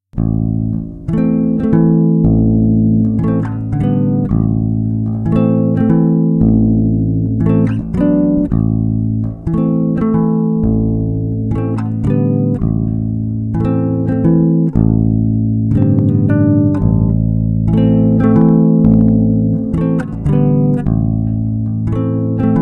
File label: bass sound sample neck; jormungandr